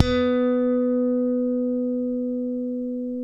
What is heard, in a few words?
Bass, B 4, ALL THUMBS; Spectrasonics; Bass Legends